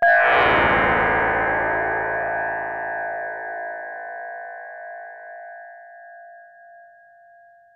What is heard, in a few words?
evil; Tardiz